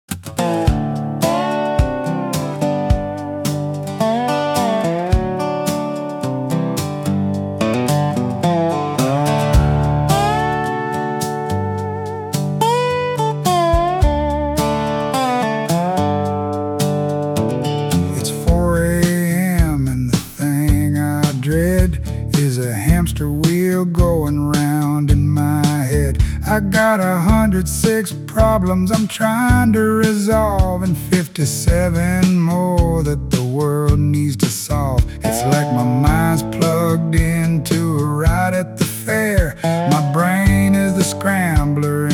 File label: Vocals